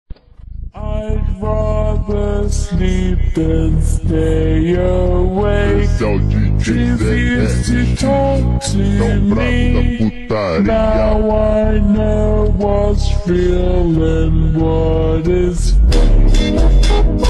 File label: funk slowed